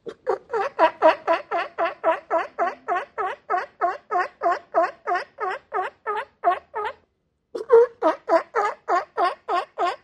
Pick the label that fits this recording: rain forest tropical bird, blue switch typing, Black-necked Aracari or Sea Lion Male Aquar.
Sea Lion Male Aquar